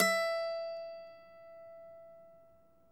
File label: MNDLN E4; Sonic Reality; American Heartland